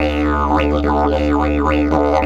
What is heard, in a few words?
108, Didgeridoo